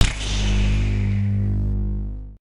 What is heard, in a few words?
open fridge